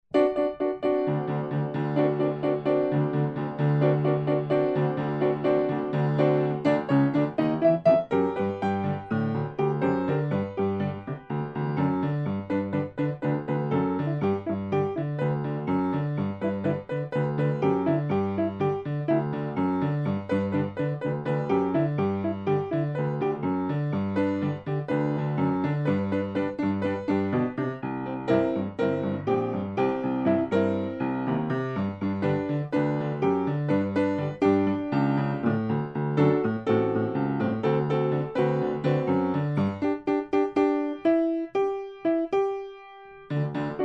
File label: New Orleans Rock Roll, Piano; piano kurs